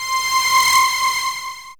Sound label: synth c, Dance Synths, Juno; ProSamples vol.37; Juno Jam; Best Service